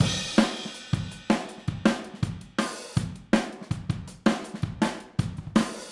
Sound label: Overhead, Drums, 162 BPM; Drums from the Big Room The Mixes; Take Your Toms Off; Verse